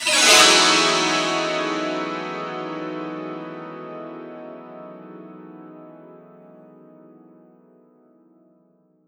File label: STR, SANTOOR